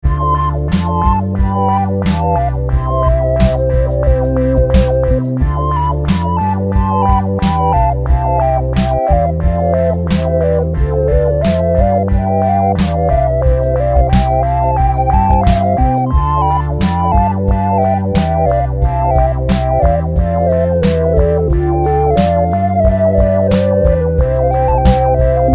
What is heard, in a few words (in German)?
blockfloeten